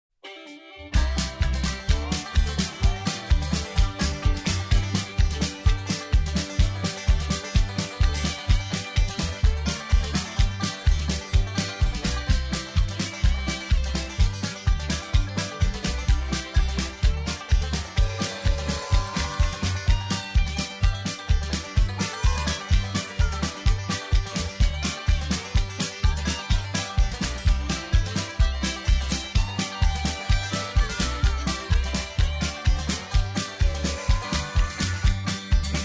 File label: hoedown, square dance music